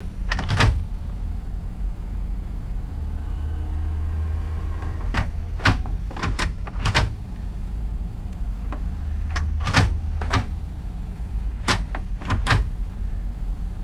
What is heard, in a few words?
vintage car automatic gear shift lever clunks